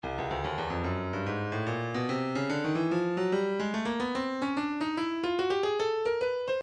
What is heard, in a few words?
breakbeat midi 073 bpm; blue note e; Stylus Breakbeat Midi Files